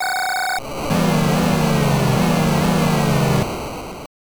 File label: Measurement ~ Booing; King Salmon; Genesis